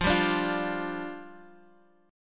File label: F 6th Suspended 2nd F, chord; F6sus2 strummed